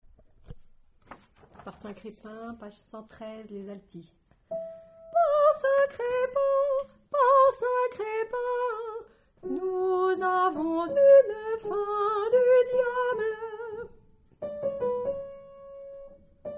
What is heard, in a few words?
Alto